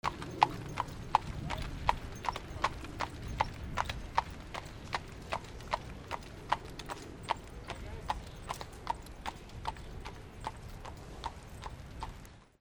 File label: ANIMALS